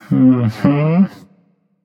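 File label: nonverbal agree; smite; voicepack; merlin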